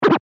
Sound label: Scratch